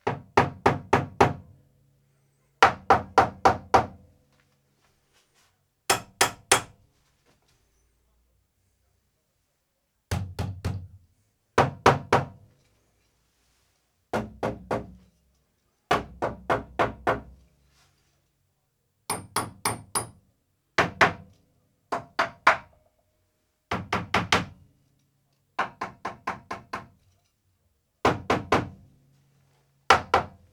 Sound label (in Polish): Mlotek Rozne, hammer various